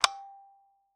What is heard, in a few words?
Taxi Meter